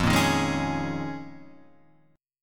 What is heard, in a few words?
Eb 7th Suspended 2nd Suspended 4th